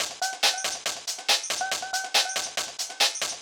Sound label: Voxy Break, Club Techno, 140 BPM; Zero-G; Construction Kits